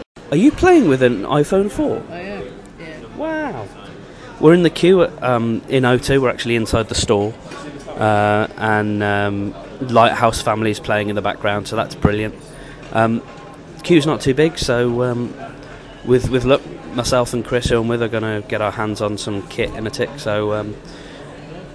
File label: queue